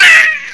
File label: death; alienarena; xeno